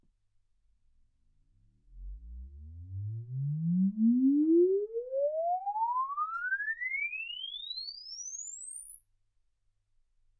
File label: exp swept sinus 10Hz 24kHz